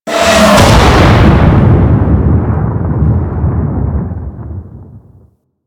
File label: Frame Spawn In; DE; Warframe; Vay Hek